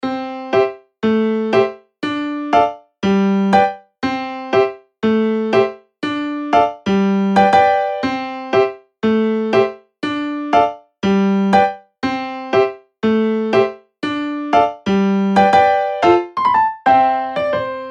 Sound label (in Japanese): かえるのピアノ